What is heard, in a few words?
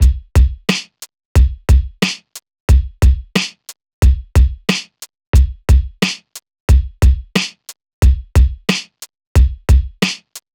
90s hiphop loop 90; Reference beat bpm90-A